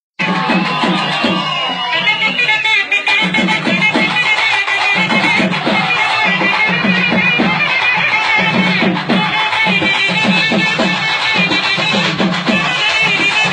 drums music festival mass; amaran

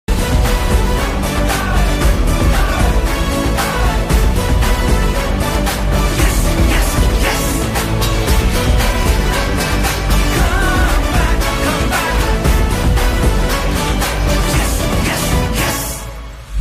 bgm ringtone; hindustani 2; kamal haasan

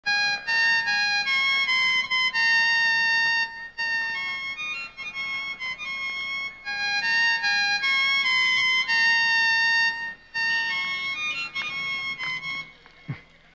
tamil mouth organ bgm

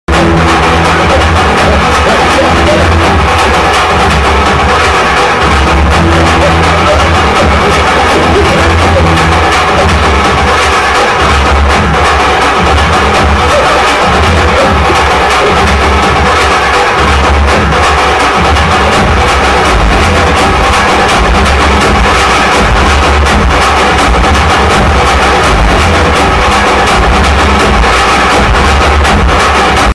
movie climax bgm ringtone movie bgm; ambajipeta marriage band

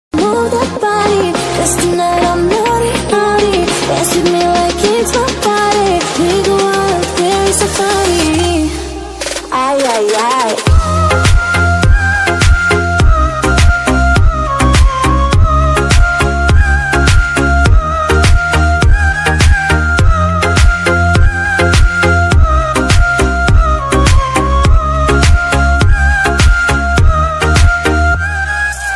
remix ringtone

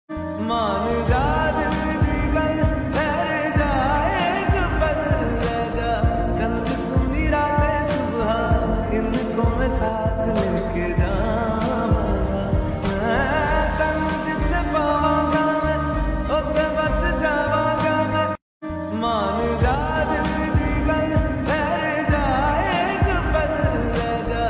romantic ringtones

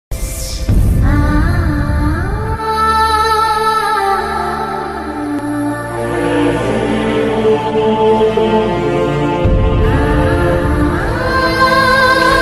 Heart touching very sad; magadheera movie; ram charan

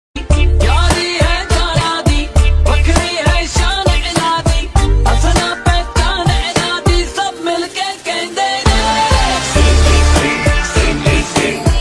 punjabi ringtone new punjabi ringtones

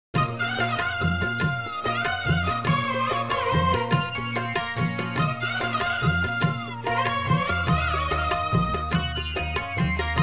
song bgm, shehnai; played by padmashri pandit dr s ballesh

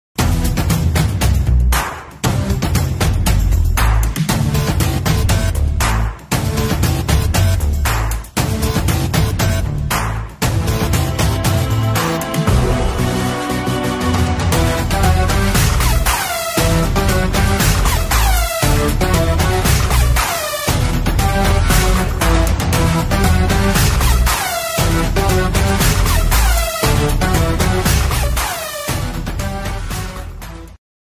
background music theme song; boss 2; বস; boss bgm

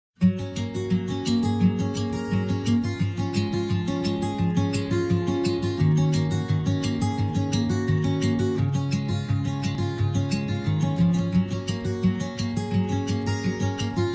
hindi romantic ringtone, bollywood ringtone; i love assam india music genius

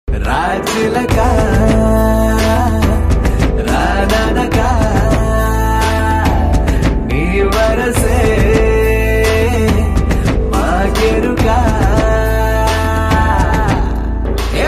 telugu love ringtone romantic love song ringtone

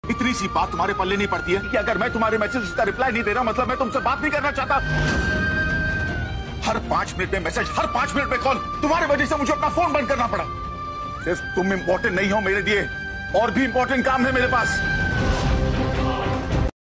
girl crying; very sad girl; broken girl

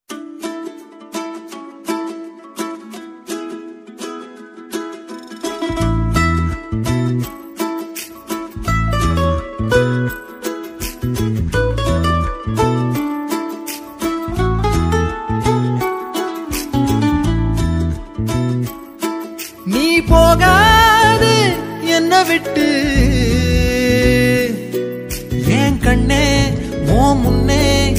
song bgm; cute love scene

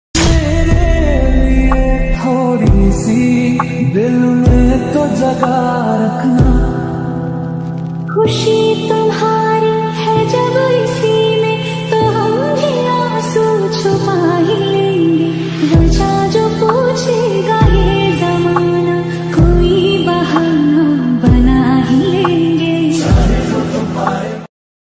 lofi ringtone

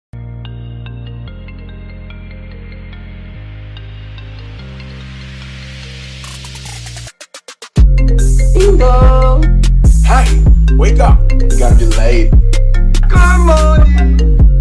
alarm ringtone

